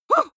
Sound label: female fight huh short; comedy-cartoon; soundpool